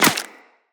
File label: Bullet Impact Flesh; Weapons; Bullet Impacts